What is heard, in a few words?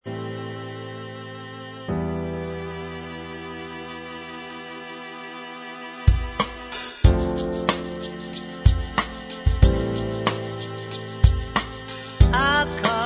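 backing vocals, backing tracks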